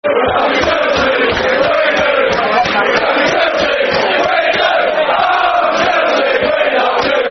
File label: We Love You Chelsea, Football Chants (Soccer Song)